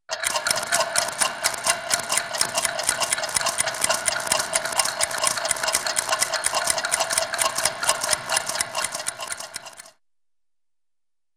Several Different Rapid Clocks Ticking At Different; Hollywood Edge; Cartoon Trax